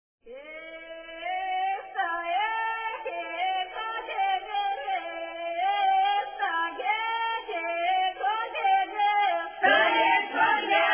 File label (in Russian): новогодняя поздравительная, с.Секирино Скопинского р-на; запевает; Эй, ивсеня-коляда